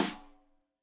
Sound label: Rim Shot Stereo; Deeply Sampled Drums; SMDrum